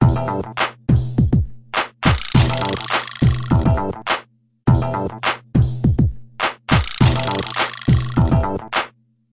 roll the dice, 103 bpm, drum loop; 101 to 110 bpm

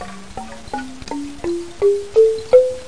noisy mbira climbup; WORLD

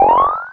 coin, video games; mario

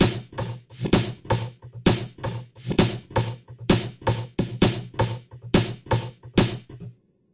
131 bpm offsnare, drum loops and breaks; 131 to 140 bpm; samples-ghost

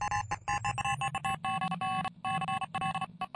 morsetune, filtered morsecode like

